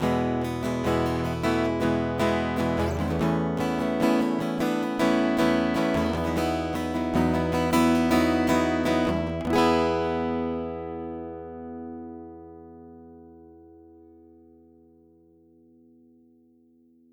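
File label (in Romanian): Acc Cablu Mogami, chitara acustica; Acc G50 Tone Cable Off; Line 6 Relay; wireless pentru chitara de la Line 6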